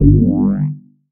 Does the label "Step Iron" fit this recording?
No